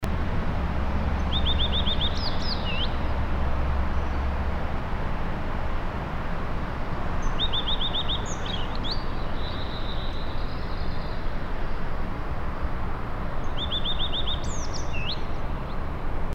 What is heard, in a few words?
song aberrant